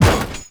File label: turret crash small